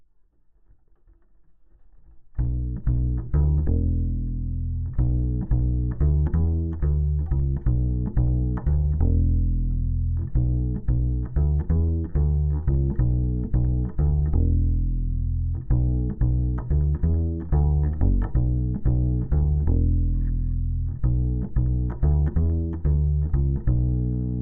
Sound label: Bass DI